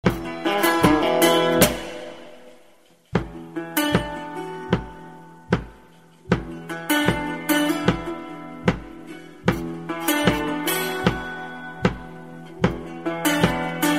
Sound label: percussion